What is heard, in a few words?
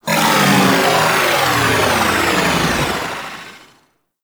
Stomp Wave; Enemies; Weapons; Warframe; Tau; Sentient Dactolyst